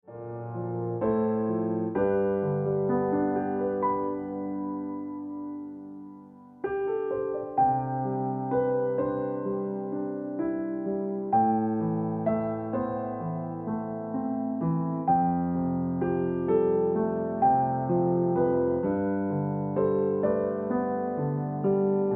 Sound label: Ringtone Piano Music